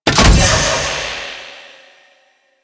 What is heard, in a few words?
Seismic Disruptor A; Warframe; Ambience; Grineer Asteroid